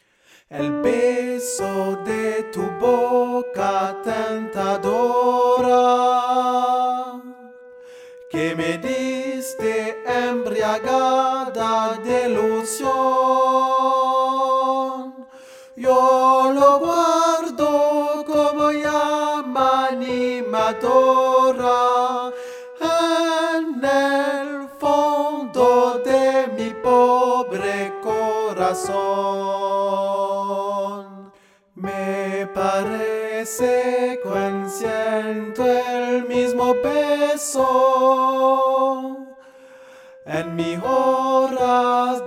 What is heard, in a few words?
Soprano; Dulce embeleso